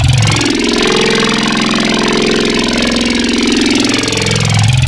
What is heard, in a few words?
Growl Table, Serum; Cymatics